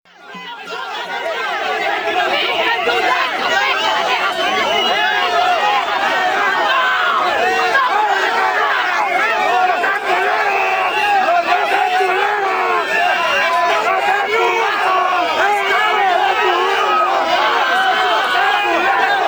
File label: Ambiences, Places and Ambience; Vengeance Samples; FX Vocals